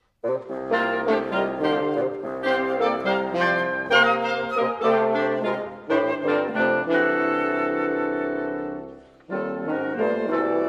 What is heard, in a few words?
rhythm